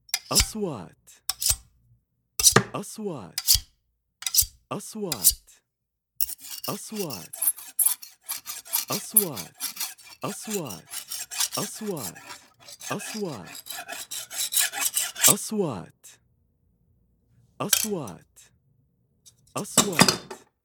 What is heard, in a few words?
plumbing iron saw saw metals wood tools